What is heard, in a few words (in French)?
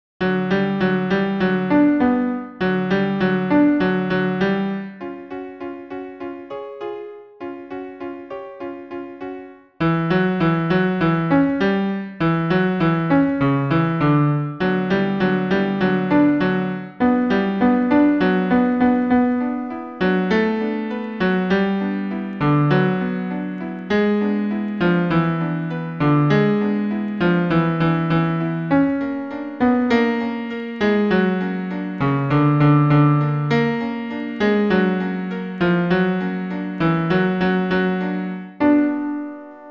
tango ten pian acc